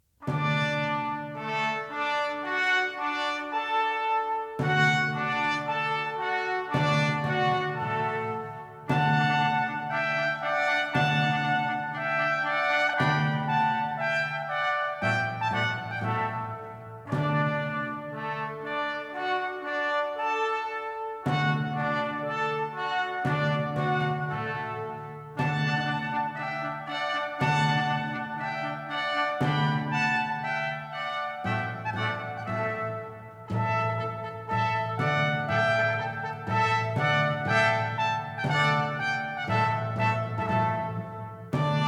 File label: fanfare; Anton Diabelli